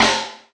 Processed Ethn Perc, Doru Malaia Percussion

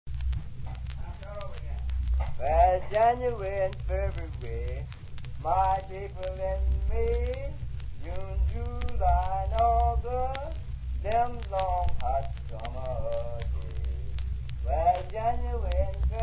tie tamping chant; PUBLIC DOMAIN MUSIC; lomax; 1939 january february

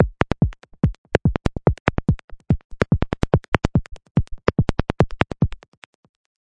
clicker, drum loops and breaks; 141 to 160 bpm

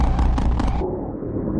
149 bpm, drum loops and breaks; 141 to 160 bpm

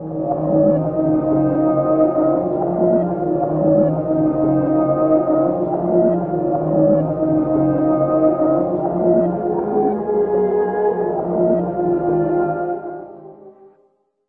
submerged strings; ORCHESTRAL and CLASSICAL

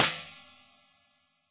Fracture Hi Hat, Found Percussion Hits; UNUSUAL KITS and FX; ModeAudio

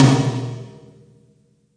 ambient tom

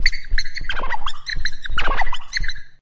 170 itchy scratching, drum loops and breaks; 161 to 180 bpm

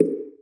cyborg Pop Reverb; UNUSUAL KITS and FX

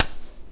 stick ish, Cigarette Lighter; UNUSUAL KITS and FX

